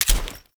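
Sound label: UI select item; Veilbreaker; Kahl Customization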